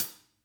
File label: HAT; Sonic Reality - Vintage Timetraveler